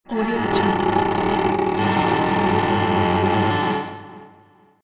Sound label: cello fx